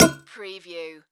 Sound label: Sword Hit Wood